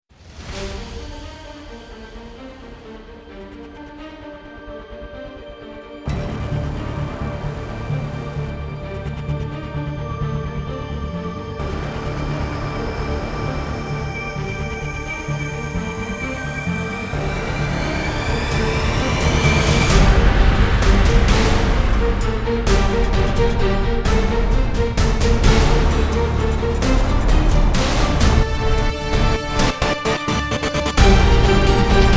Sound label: No Choir